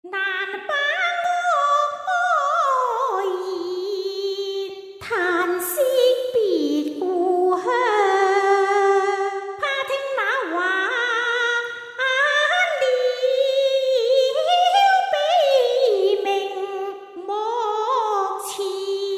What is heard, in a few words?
SINGING Chinese Opera Diva Phrases; Spectrasonics Heart Of Asia